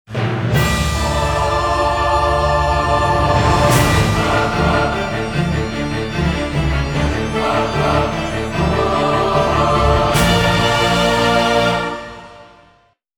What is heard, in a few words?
BGM Classical